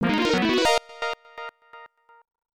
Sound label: Regular Battle Start; Xuanyuan Jian Waizhuan Feng Zhi Wu; IBM PC AT